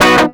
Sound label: guitar stab